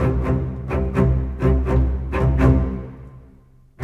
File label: Bass Cello; Sonic Foundry (Sony Creative Software); Orchestral Series VOL-4; Rock and Pop; Rock-Pop 18